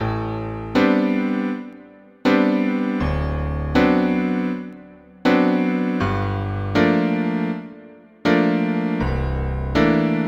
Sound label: Swing Montuno no chords